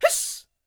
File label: YELP, World Voices, ANDES FX